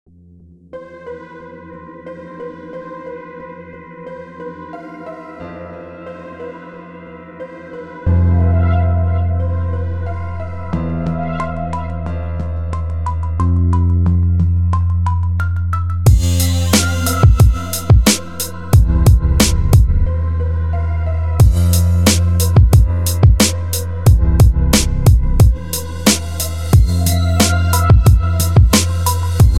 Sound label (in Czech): melodii beat